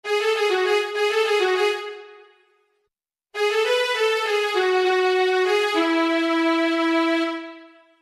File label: cure reverse, reverse reverb